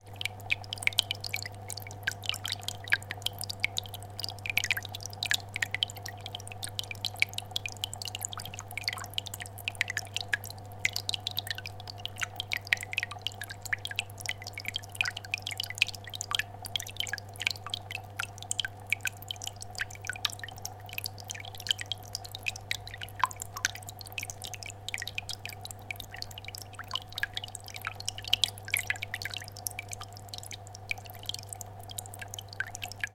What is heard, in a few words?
household fishtank filter